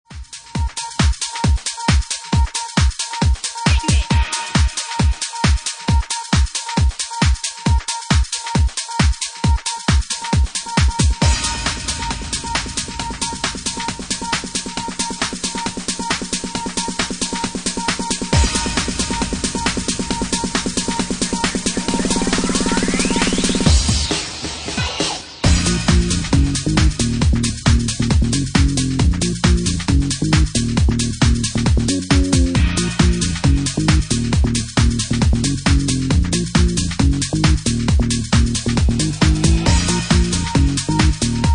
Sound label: Organ Mix